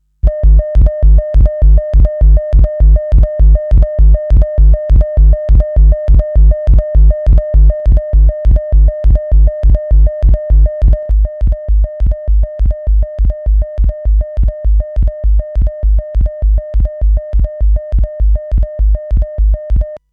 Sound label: techno